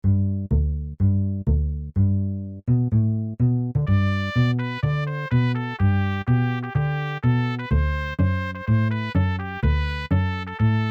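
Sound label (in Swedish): Dals-Ed Bröllopsmarsch; folkminnen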